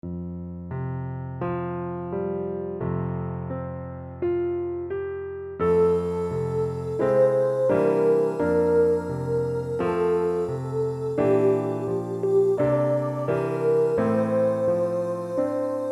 Piano Study F MED

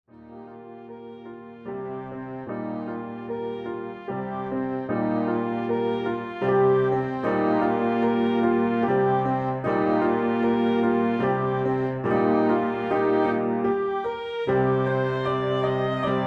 Harp strings Med

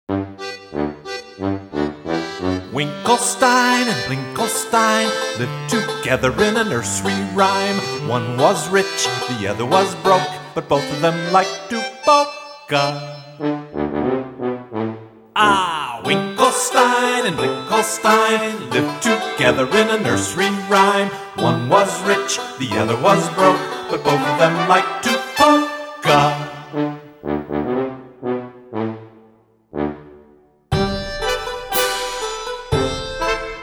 polka; for kids only

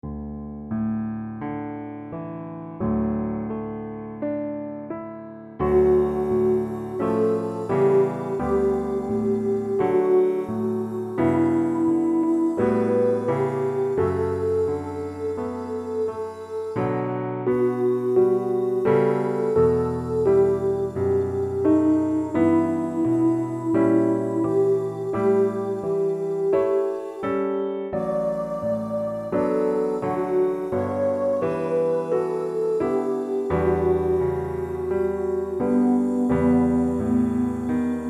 Piano Study D LOW

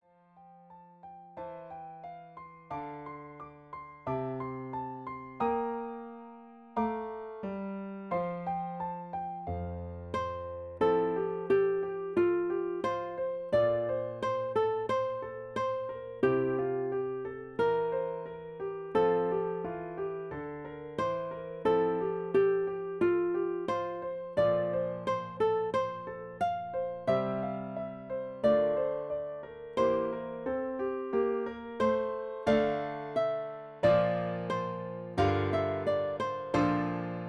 Harpstrings high study

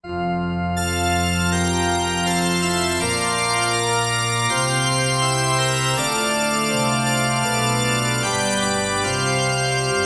acc

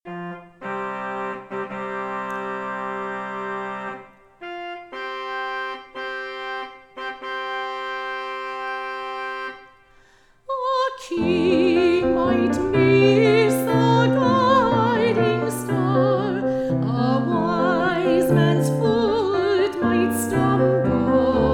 christmas trumpets

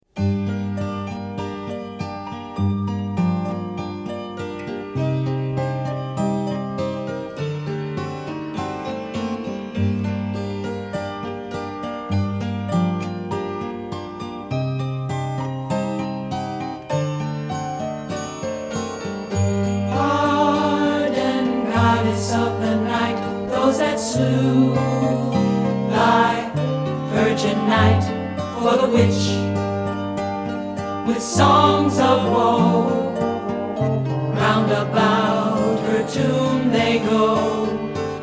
HEAVILY w VOCALS

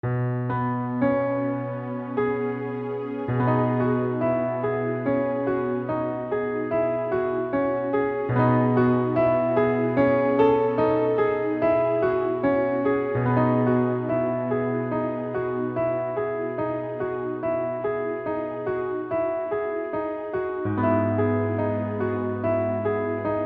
Mothers Evening Prayer Acc Low

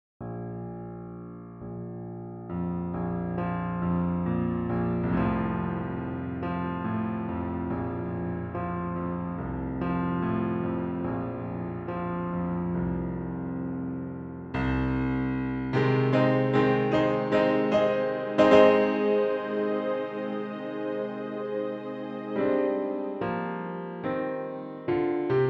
Psalm Accomp Med; Psalm 8

